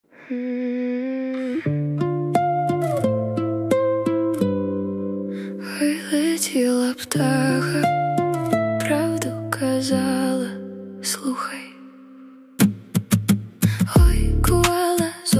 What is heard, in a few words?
ukrainian ethno pop